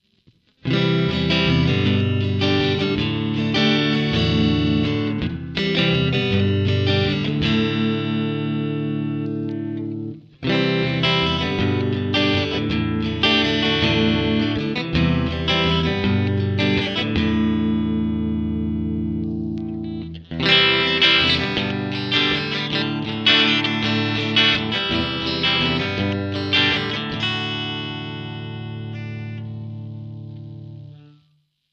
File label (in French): clair accords